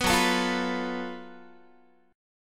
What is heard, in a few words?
Gb Minor Major 7th Double Flat 5th Gb Guitar; strummed